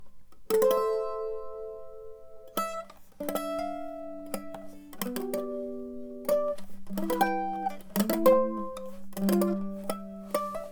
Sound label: Sarabande Mandolin dry; Reusner